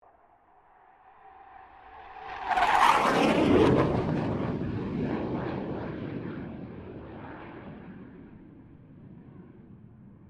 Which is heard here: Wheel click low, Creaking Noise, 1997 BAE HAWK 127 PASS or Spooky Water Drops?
1997 BAE HAWK 127 PASS